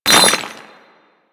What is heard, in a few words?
Melee Impact Cement; Warframe; Weapons